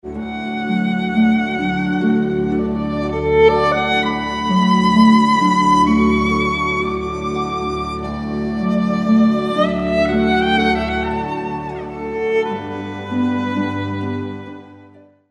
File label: massenet thais meditation; 1894